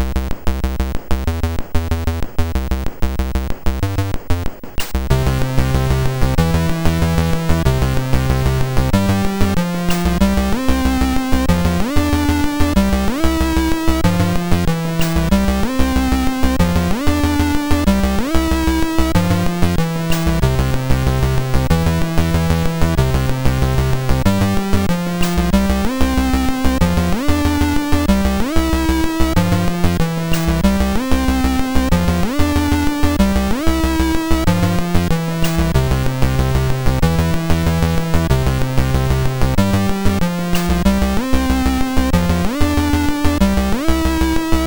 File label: T-Rex 64, MOS6581R2